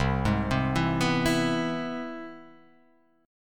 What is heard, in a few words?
C Augmented Major 7th